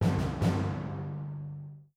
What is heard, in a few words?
drums